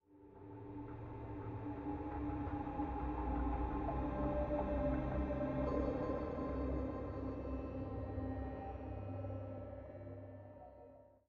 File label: Basic Low Beat 85bpm; Legends of Pegasus; windows gamerip 2012